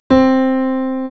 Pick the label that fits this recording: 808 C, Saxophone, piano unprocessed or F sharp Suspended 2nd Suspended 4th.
piano unprocessed